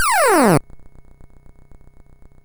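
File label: Nebulus, MOS 8580 SID chip music, games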